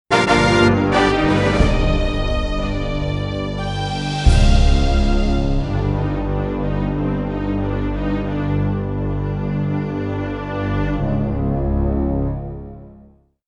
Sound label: All Clear - Jingle